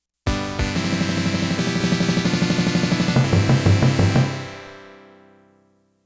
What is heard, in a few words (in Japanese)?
修行の成果 ～ファンファーレ～ (OPNA); Undine OPNA version soundtracks 2020